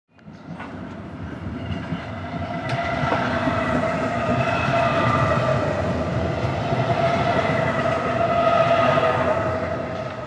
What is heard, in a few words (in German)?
S Bahn Vorbeifahrt